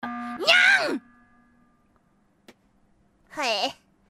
polka

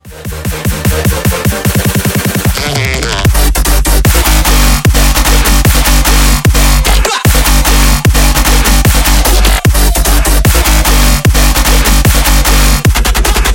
twitch donation alert

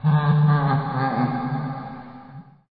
laugh

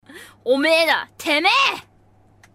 polka

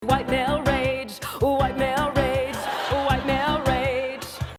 white male rage

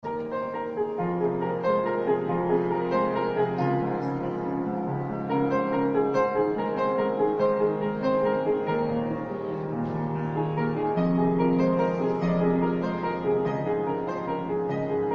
piano, traveler playing; luxemburg city

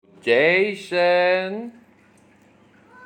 oooooohhhhhh

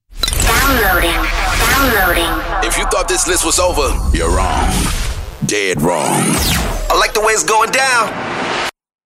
Drops

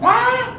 waaah

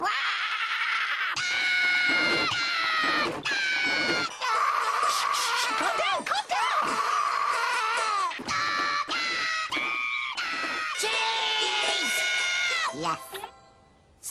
cheese scream

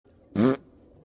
fart

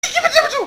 death

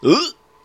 uhhhghe

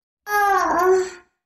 uh sex moan girl

